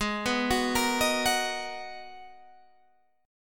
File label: G sharp Minor 9th G sharp, Guitar Standard; arpeggiated; JGuitar